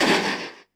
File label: TARGET, Anime Sound FX; AKAI Anime Sound FX